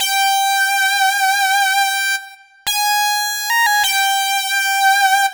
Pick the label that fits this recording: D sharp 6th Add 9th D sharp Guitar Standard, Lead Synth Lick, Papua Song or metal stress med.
Lead Synth Lick